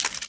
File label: insert shell, sawedoff, weapons; csgo